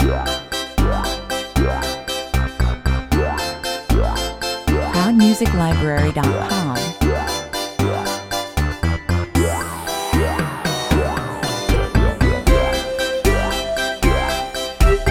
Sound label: retro video game circus (underscore version)